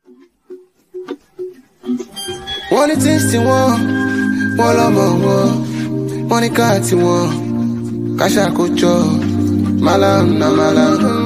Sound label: Acoustic